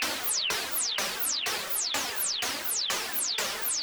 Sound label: Juno-60 Arp 125BPM; noise hiss crackle